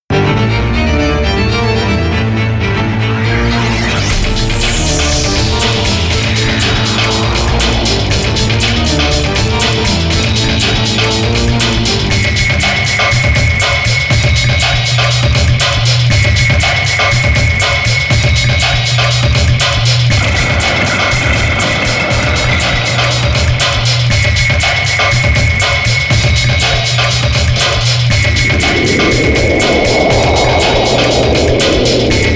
cyber; BGM; maoudamashii

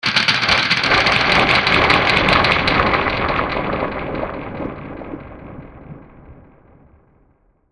element thunder; maoudamashii

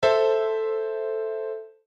instruments piano am chord; maoudamashii

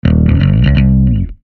instruments bass; maoudamashii

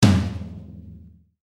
instruments drum tom; maoudamashii

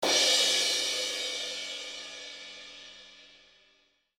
instruments drum cymbal; maoudamashii

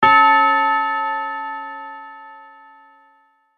chime; maoudamashii

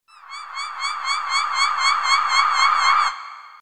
voice bird; maoudamashii

